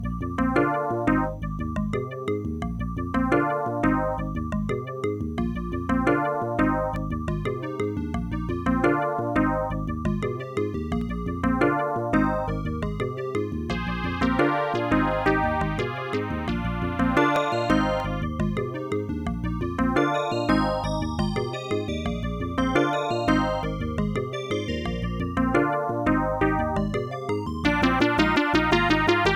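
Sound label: level1, Creative SoundBlaster 16 ct2740; soundcard test; Hocus Pocus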